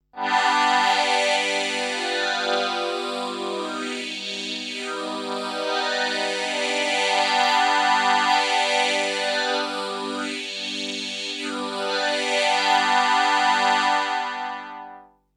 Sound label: ASR-10 Transwaves; Julia